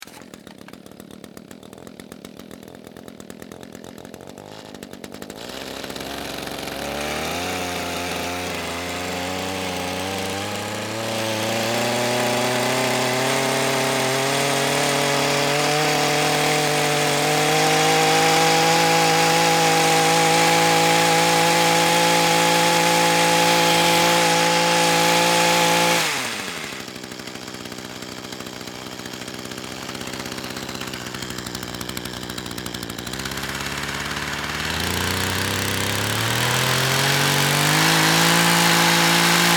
Hedge Trimmer Run, Household, Hedge, Trimmer, Pull, Start, Run, Off; Weed Whacker; BlastWave